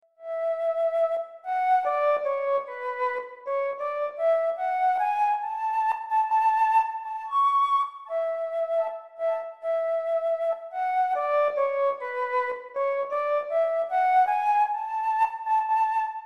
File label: Flute